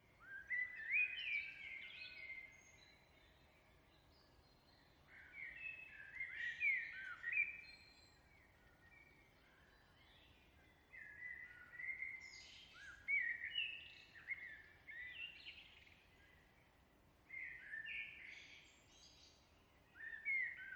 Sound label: Nature Birds Evening UK Countryside Song Birds Pheasant Woodland